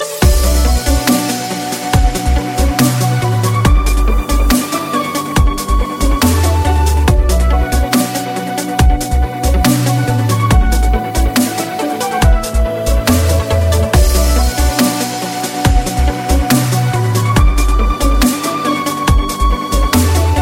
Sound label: dubstep; the hobbit